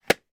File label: small plastic box lid shut; foley